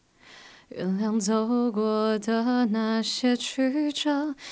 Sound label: Alto